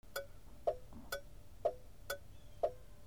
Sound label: CARS